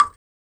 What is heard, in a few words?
RSS PERC